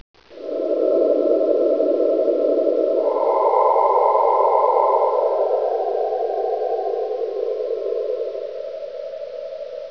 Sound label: wind